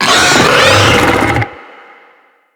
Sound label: Infested Ancient Attack; Enemies